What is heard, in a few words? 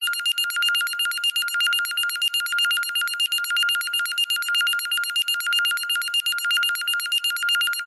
science fiction alarm beeps emergency high pitched